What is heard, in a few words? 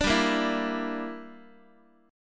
G Minor 6th, Guitar; Gm6 strummed; JGuitar